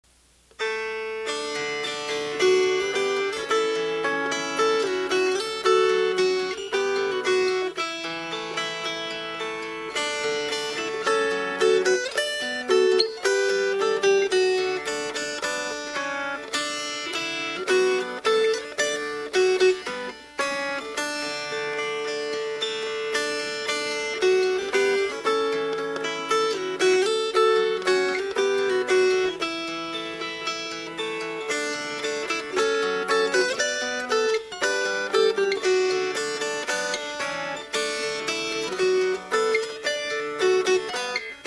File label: Fingerpicked